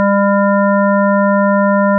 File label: 200 odd harmonics